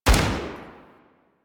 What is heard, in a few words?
Rifle Shot B; Sundial